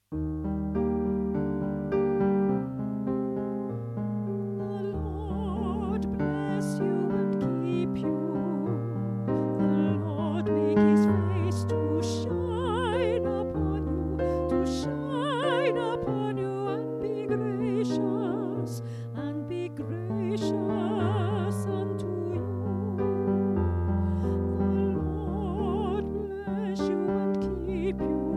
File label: Soprano 1, choir